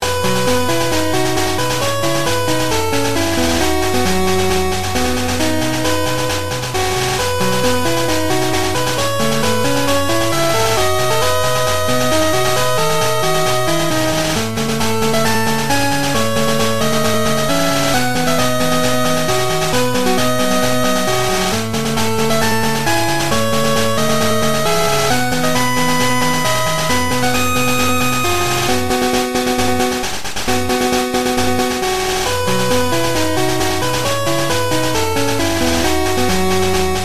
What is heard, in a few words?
Route Music; RouteMusic3; P3D-Legacy